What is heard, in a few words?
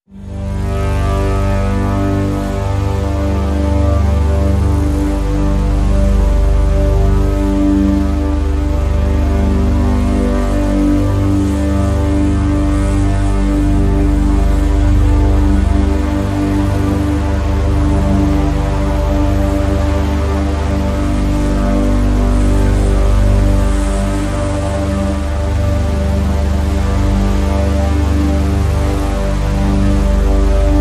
Drn Bowed Bass G